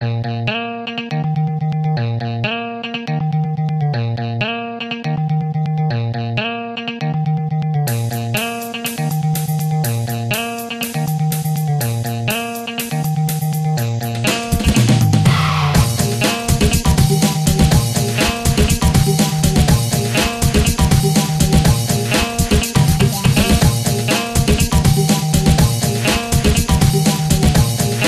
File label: Mega Funky Beat